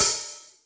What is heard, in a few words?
H Opn, Hi-Hat, Yamaha DTXpress